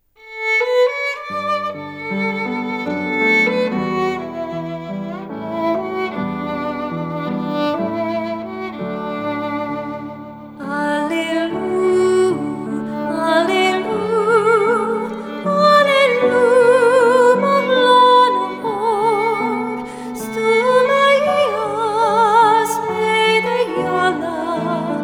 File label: Alleluia Mo Ghra Sa Mo Dhia with Piano Violin